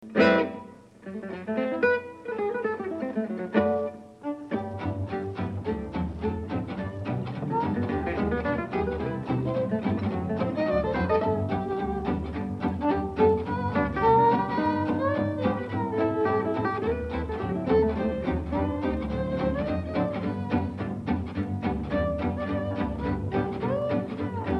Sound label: jazz